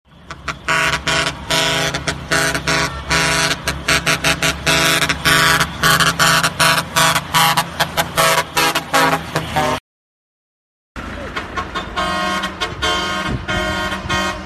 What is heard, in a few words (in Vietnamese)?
tiếng còi hơi xe tải